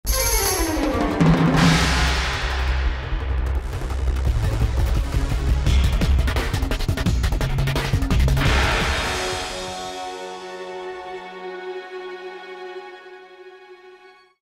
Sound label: Laser Sequence; ben 10 protector of earth ost; Vilgax